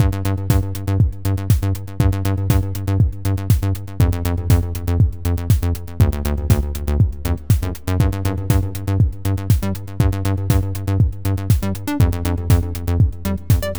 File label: Menu